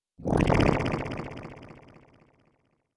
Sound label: Slime gun